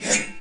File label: ax swing; triple-aaa-games; insurrectionist